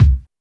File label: perfect kick single hit c sharp key; kick drum samples